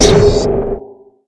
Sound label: jumppad